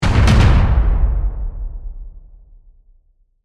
Hit Drum Hits; Production Element; Imaging Element; Accent; Transition; Hit Sound Effects; BlastWave

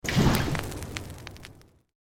Created Magic Spell Fireball Impact

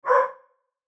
Dog Mutt Bark; Animal; Dog Sound Effects

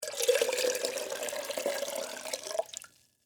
Water Pour Pitcher, Metal; Pouring; Sound Effects:Objects; BlastWave

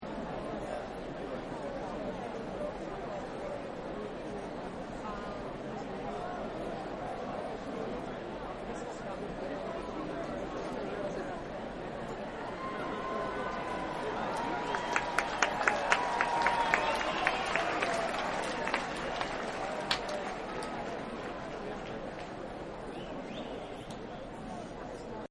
Arena Ambience, crowd; Arena Ambience 3; Crowd Sound Effects

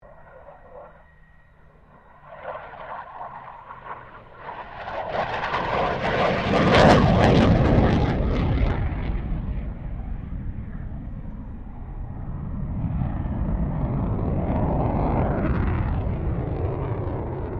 Airoplane Jetfighter FA-18 Hornet Flyby, Airshow; Avalon Airshow 2009; Airplanes; Vehicles; SoundEffects-Foundation1

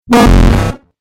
Dubstep Audio Laser; Laser Sound

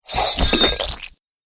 Morning Star Weapon Splatters Victim; Battle Sound Effects

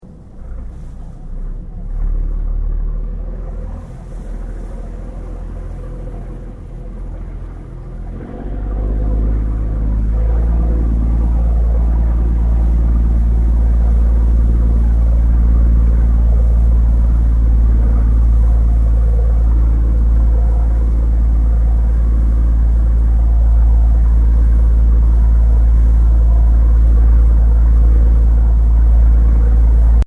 Boat Tourist Ferry Idle To Slow Move

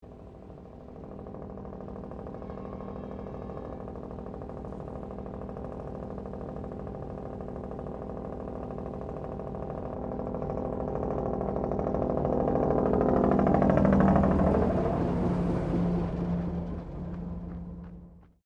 Train Diesel X Class 1951 Pass By; Tasmanian; TGR; Bellarine Tourist railway vehicles; Trains

